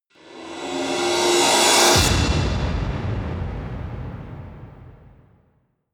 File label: whoosh explosion hard